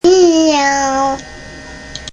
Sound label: meow